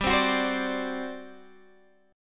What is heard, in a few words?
G Major 7th Suspended 4th, Guitar, Standard; GM7sus4; strummed; x,10,10,11,13,10; down; capo=3